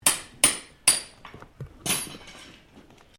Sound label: FARRIER HAMMERING HORSE SHOE, Horse being shoed; Farms